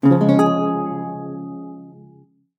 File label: Strum; Google Pixel